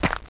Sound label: dirt